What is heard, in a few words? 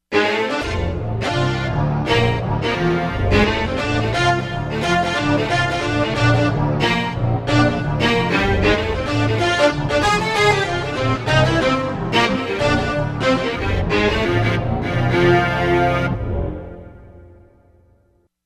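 ethno brass, Korg; Korg MSC-13S Ethnic 2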